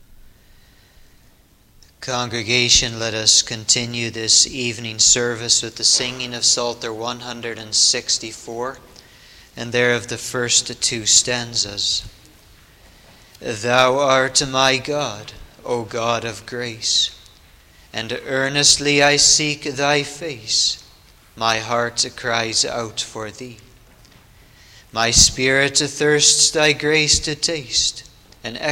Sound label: Singing; The Desire of the Spiritual Bride; song of solomon 1 2